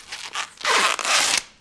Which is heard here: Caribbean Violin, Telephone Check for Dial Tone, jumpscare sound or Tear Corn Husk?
Tear Corn Husk